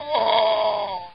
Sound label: DYING; BattleSNDS